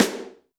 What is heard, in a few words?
snare, Zoom MRT-3B